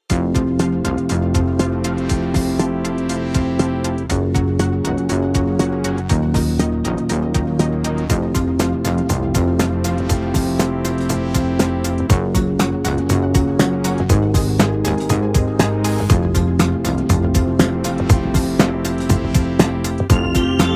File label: Retro electronic music intro